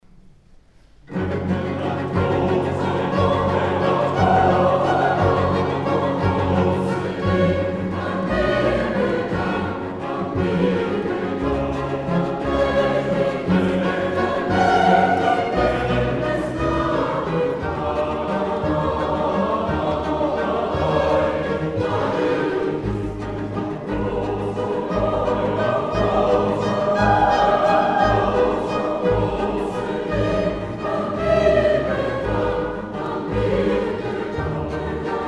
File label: Coro